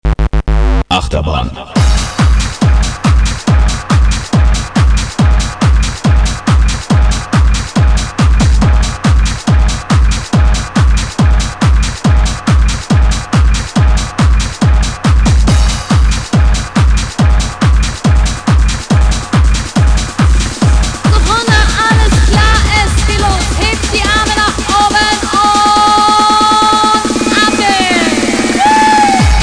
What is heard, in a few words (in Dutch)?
Kermis Jingles; 8 Terbahn; Kermis Jingles Diversen